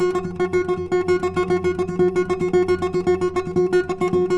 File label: NYL TR; Spectrasonics; Hans Zimmer Guitars VOL-2; FLAMENCO FX